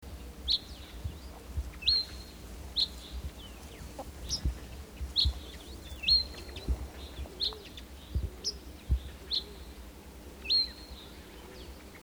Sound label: saxaul sparrow